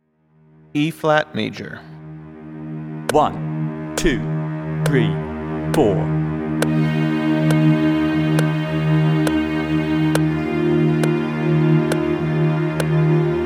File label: Eb Major Scale with Drone; Automate and Motivate 21st Century String Players with Technology